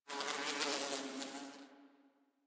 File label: Bee; Warframe; Ambience; Nineteen Ninety Nine